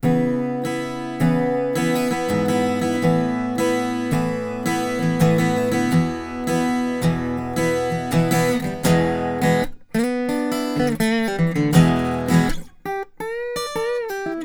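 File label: Acoustic Guitar Bridge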